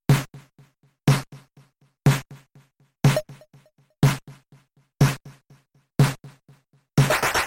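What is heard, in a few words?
swinging percussion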